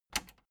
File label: plastic button latch loose click enable fast